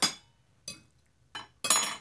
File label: dinner plates, ambient materials; Sourceforts v1.9.2; hl2